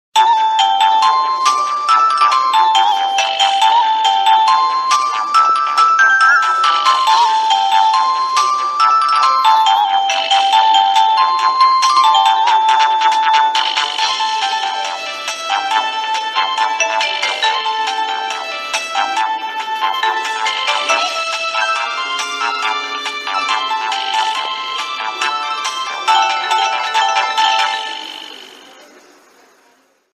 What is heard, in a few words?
Nokia 1112; nhac chuong